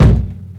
perfect steel kick drum A key, steel kick drum tuned to the A note